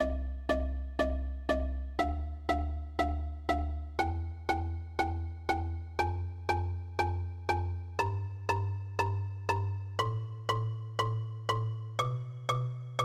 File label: Test Marimbas Tone